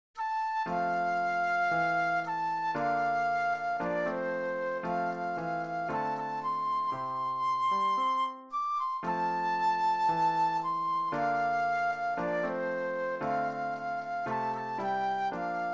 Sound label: Flute Solo; christian solos